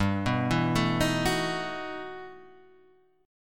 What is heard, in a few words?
E Minor Major 11th G, guitar